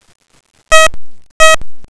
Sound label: detect